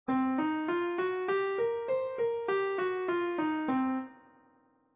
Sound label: pentatonic, blues